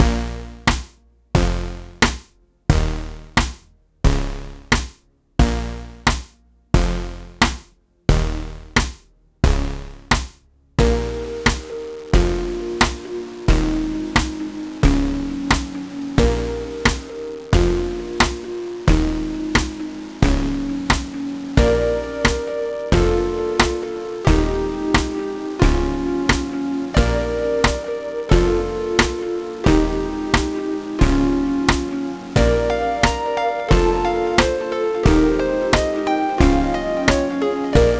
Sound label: lo-iq bip-bop beat; Outtakes and Random Stuff